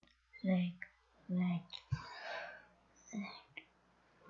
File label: laugh